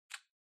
Smartphone Lock Unlock Speaker

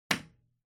Light Switch-Box-Rocker (Wall-Hard)

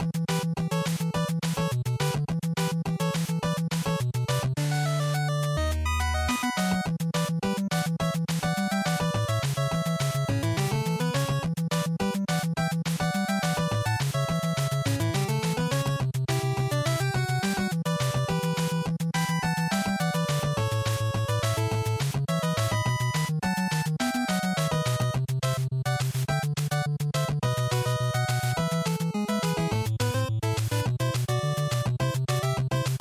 Action Stage Loop, bgm

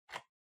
PC Mouse Far Scroll Fast

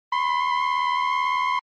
Retro Anime System

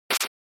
Attack Whip; SNES; RPG

